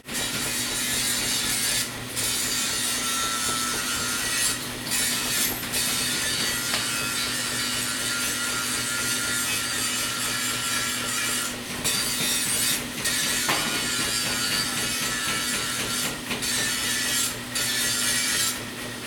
Rue des forgerons

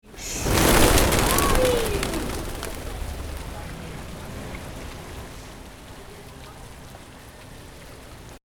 envol de pigeons

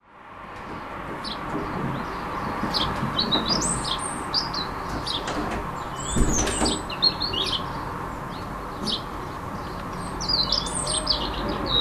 Rouge-gorge dans la ville

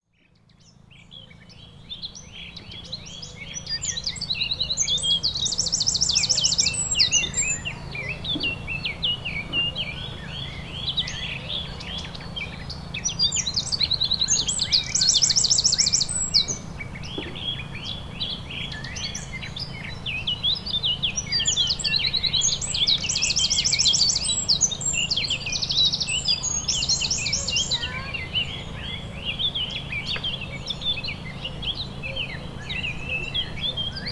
Oiseaux les Seilleres

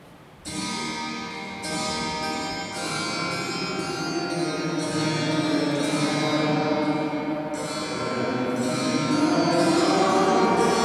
Clavecin dans l Eglise; Otto Wagner; Vienne

